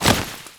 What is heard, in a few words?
Venus Hoverboard Land, Vehicles; Warframe